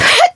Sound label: Voice attack; mayu